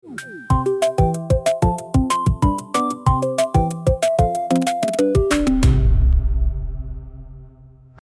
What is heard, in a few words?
end song